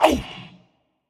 ouch; smite; ares

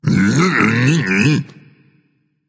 tongue, voice; Smite; ymir skin14

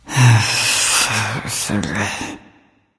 grumbling; freya

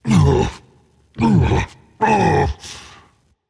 boohoo rage; chaac

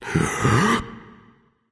nv breath, vox; smite; voicepack; vulcan

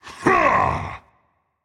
yay rage; chaac

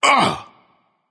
grunt hit, voicepack; smite; vamana

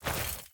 armor; smite; merlin

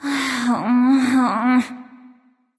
grumble; smite; amaterasu

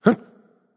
grunt jump; smite; ra

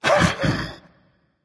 heh heh berserker; cuchulainn

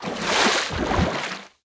splash emerge; smite; poseidon

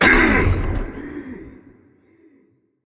clear throat; smite; sylvanus

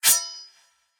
claw ring; smite; god cthulhu

